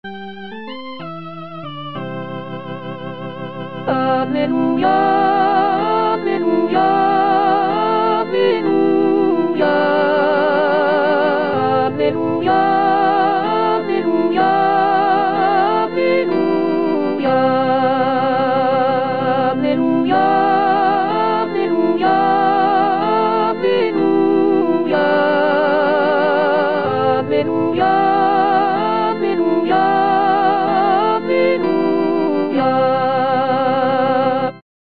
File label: Alleluia C 3 voix